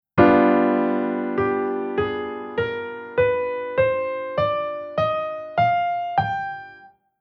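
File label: Chord Scale Minor Dominant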